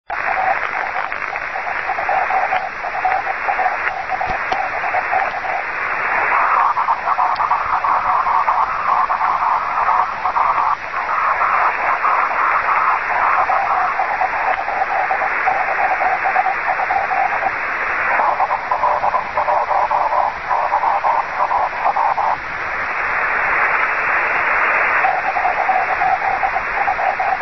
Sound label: 2m Aurora; I2FAK